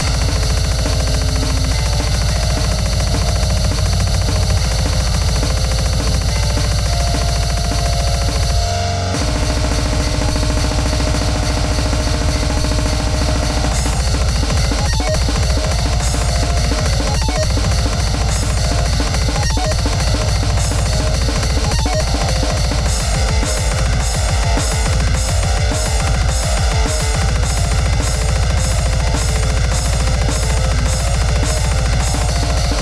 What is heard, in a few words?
Instrumental